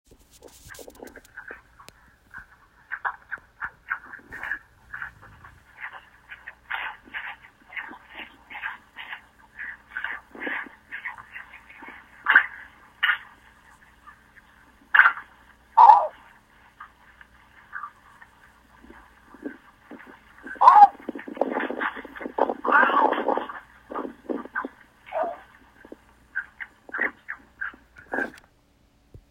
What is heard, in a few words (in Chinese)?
黃頭鷺